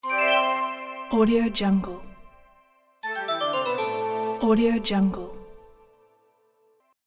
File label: quiz game show answer correct wrong